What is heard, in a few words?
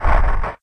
Ice; ardentryst